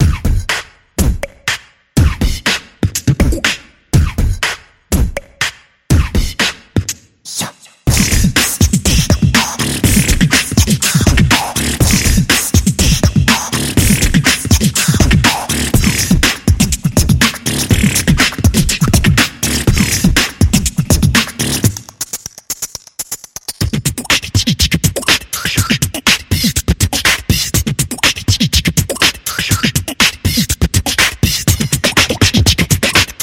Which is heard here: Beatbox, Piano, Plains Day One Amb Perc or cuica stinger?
Beatbox